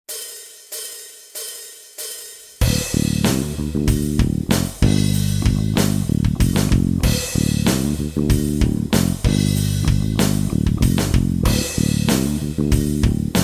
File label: drop D backing